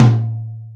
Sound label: drum tom